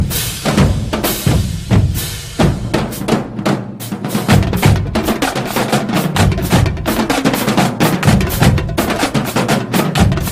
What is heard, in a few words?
Drumline